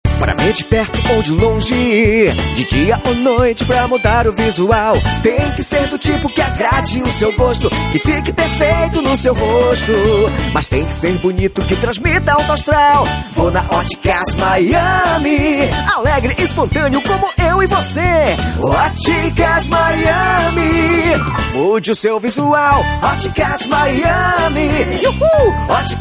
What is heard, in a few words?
jingle otica